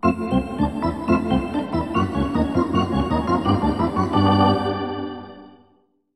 Hockey Organ

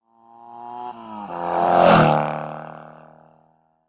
motorbike; Blind Drive

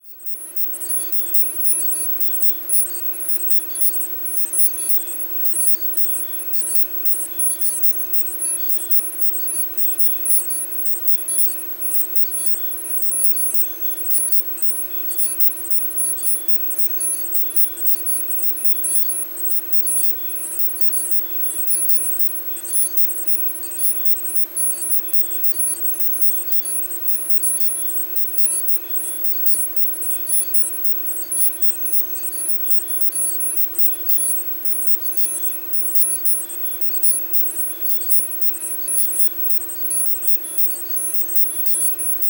Surveillance Truck; Medical; Blastwave

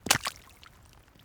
Puddle

